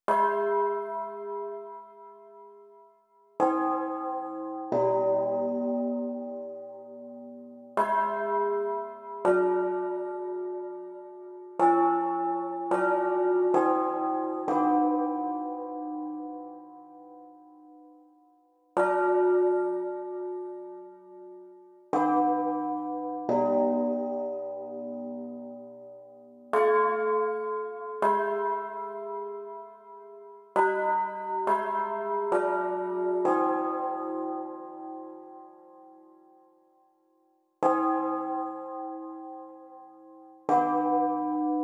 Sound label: Chime; Hirakata; pronama